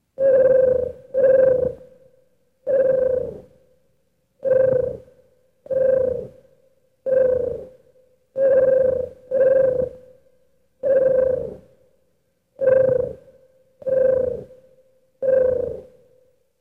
tribble coos; TOS

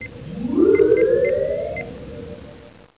powering up; TNG

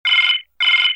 damage alarm, computer

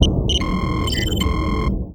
ambient bridge, computer

